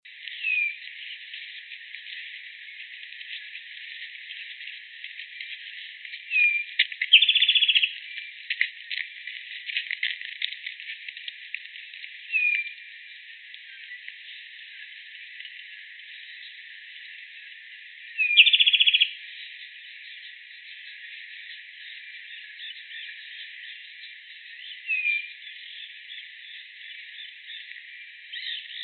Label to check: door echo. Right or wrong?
Wrong